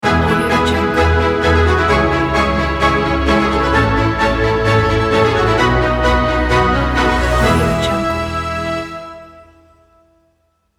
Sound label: award logo; red ninja